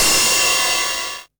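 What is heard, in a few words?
909 ride tape saturation; Goldbaby Tape808 & Tape909; The Tape 909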